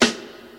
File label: subtle reverb snare single hit a sharp key; snare drum samples